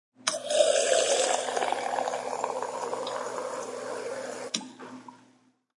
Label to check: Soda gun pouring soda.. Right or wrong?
Right